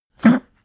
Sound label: pug gag breathe short; natur-tiere; hunde